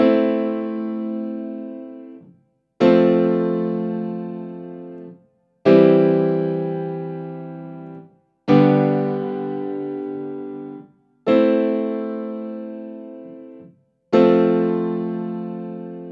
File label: Grand Piano EQ